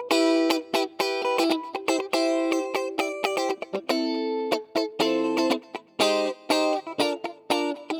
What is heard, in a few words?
Guitar, 120bpm; Construction Kits; Zero-G; Nu Disco; Nicengroovey C